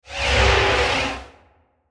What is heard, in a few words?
Whoosh Airy Flange Swirl; Blastwave